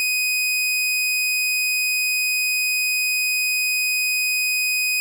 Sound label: 2.5khz saw -20db; pure test wave; AAC 320k